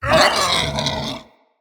Corrupted Pain, Animals; Bromius

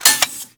Pistol Mech Clip, Weapons; Warframe; Grineer; Kohm

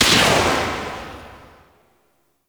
Swarm Missile Launcher Fire 2D Tail; Warframe

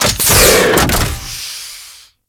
Deployable Cover Deploy; Warframe; Grineer Deployable Cover

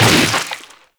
Infested Runner Arm Attack Hit E; Enemies; Abilities; Warframe; Proto Infested

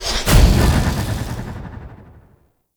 Contamination Wave Cast A; Focus; Madurai

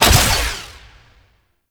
Mech Omni Tool Start; Warframe; Nechro Mech; Abilities

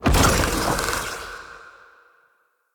Vassal Laser Impact; Enemy; Characters; Abilities; Mendicant; Mnd King

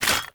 Footstep Default; Warframe; Hoplite

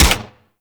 Infested Swarm Rifle 2D Shot

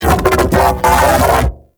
Mech Ambient Vocal; Warframe; Vehicles; Thano Mech

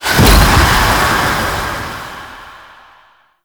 Void Dragon Firebreath Cast Poison; Enemies; Abilities; Warframe; Duviri

